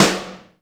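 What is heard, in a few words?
GATE snare; Crypton; DrumBase5000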